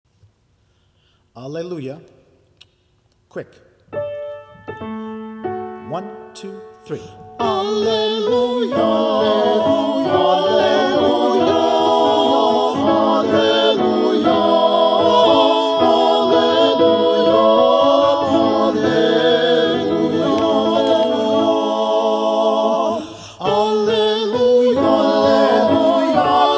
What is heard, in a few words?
alleluia, choir